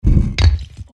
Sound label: intro outro hide; Egyptian Empress